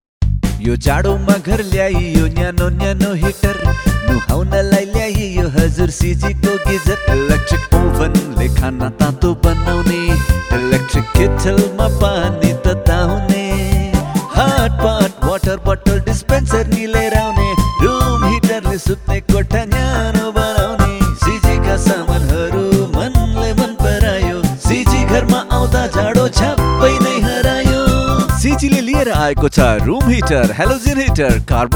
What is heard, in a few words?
Winter Jingle